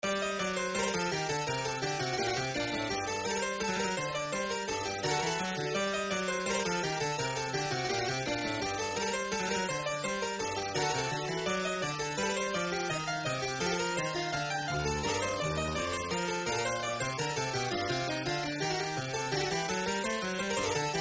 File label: Harpsichord; Francois Couperin; Concerts Royaux; Premier Concert; Gavotte; RetroMagix Harpsichord